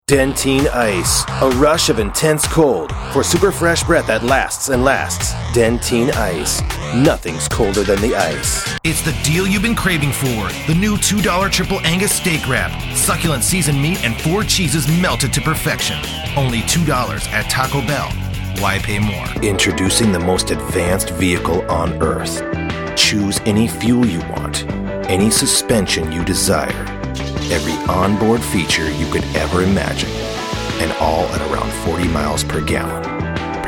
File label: bgm commercial